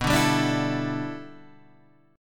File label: G 6th Add 9th B, chord